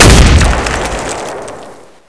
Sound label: explode